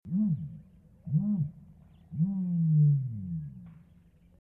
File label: struthio camelus, birds calls; struthionidae